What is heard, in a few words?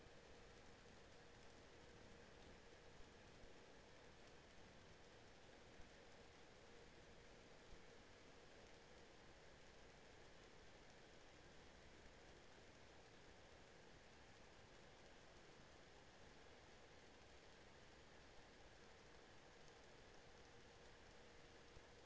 HYDROPHONE 16000Hz; JASCO AMAR HYDROPHONE 740